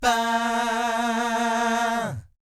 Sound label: VIB FX, Vocal Planet Jazz & FX; ILIO; JAZZCOMBO